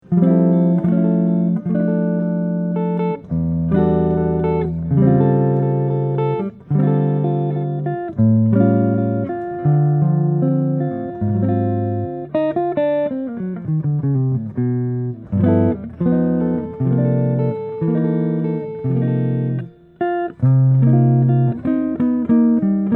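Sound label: peerless gigmaster jazz 175 sunburst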